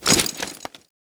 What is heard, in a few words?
knife bowie inspect start; arccw go